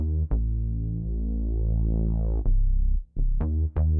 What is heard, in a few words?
bass; musdb18